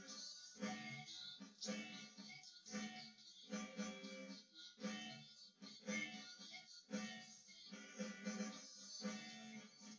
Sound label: test wdrums synthconv; SiSEC10; underdetermined